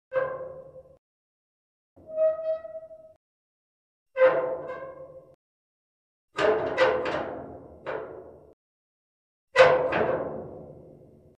Metal Squeaks Resonant Thick & Resonant, Metal Ladde; Hollywood Edge Foley Sound Library